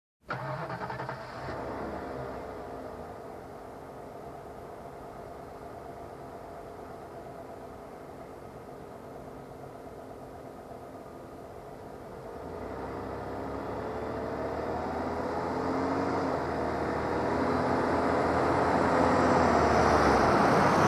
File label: Truck parking close